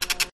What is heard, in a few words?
Vintage Dot Matrix Printer Triple Burst; Skyes Audio